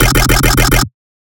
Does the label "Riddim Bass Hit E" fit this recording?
Yes